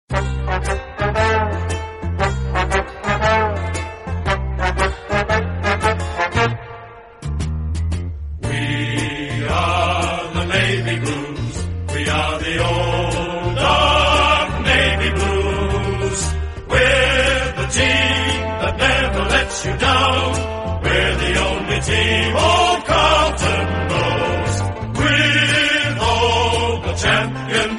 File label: theme song